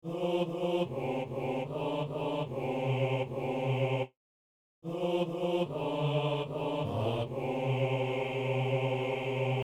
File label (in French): psaume, hommes; Psaume 125 (126); Messe du 17 janvier à Saint-Denis